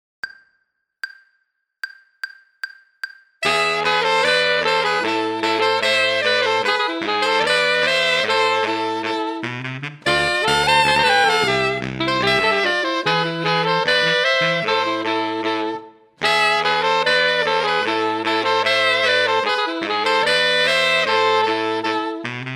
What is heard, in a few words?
playalong tenor; tetris